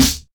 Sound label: short acoustic snare sample D key, snare sample tuned to the D note; snare drum samples